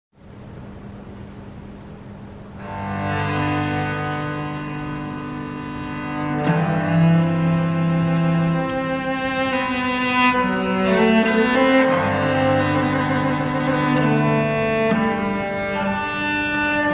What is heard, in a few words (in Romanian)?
sarabande din suita i pentru violoncel